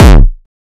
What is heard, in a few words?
Gabber Kick